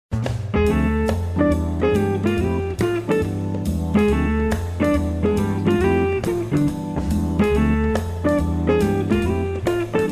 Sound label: Light Jazz Groove